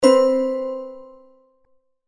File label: alert; j2 market profile set